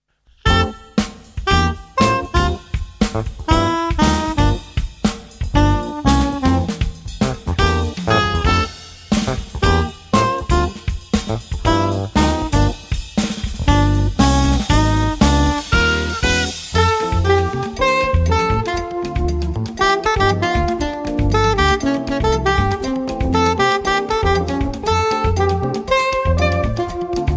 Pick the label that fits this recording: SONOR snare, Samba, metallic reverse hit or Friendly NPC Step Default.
Samba